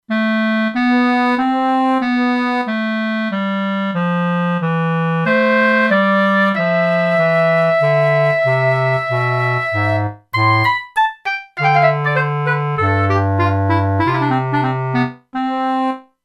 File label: Clar.-Flute, E-mu Proteus-2; Factory Presets